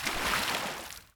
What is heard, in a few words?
water wade; player